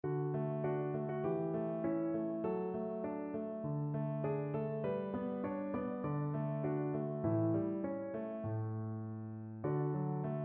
viola

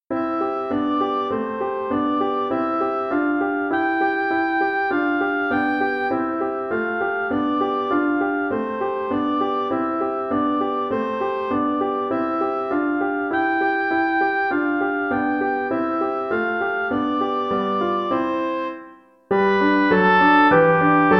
humming song, oboe

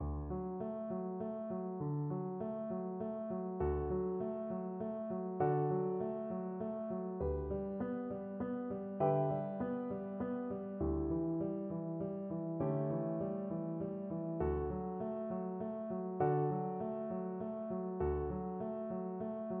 swan, cello

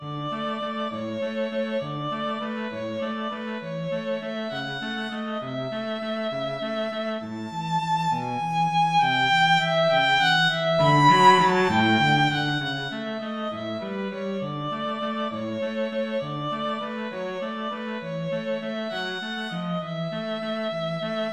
waltz, violin-cello duet

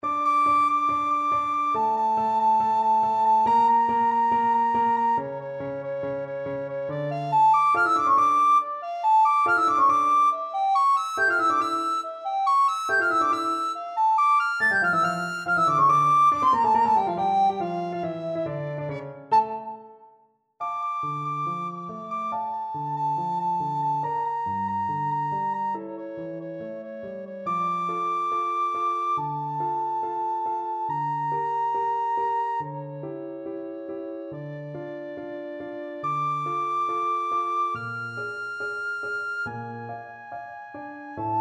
recorder

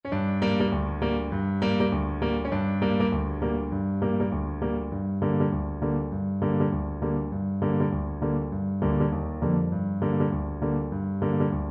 wellerman, Trumpet

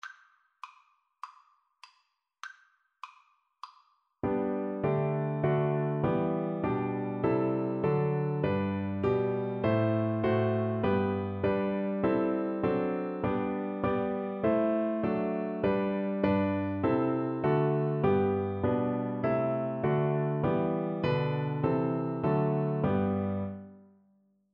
Canon for Trombone

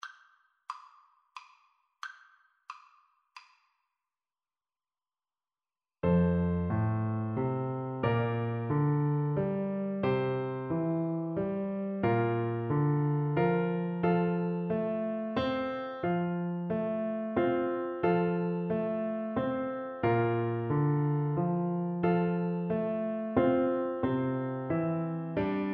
morning has broken, oboe

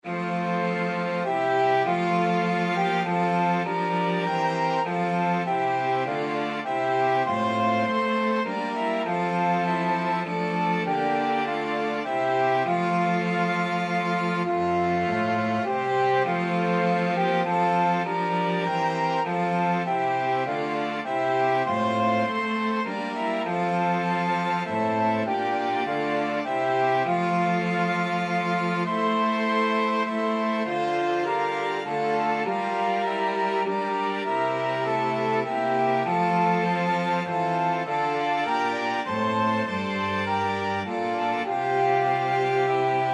flexible ensemble, 4 players

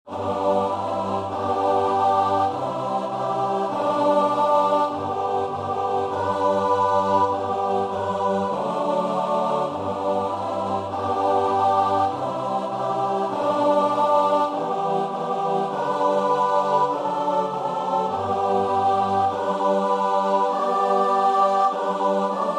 choir

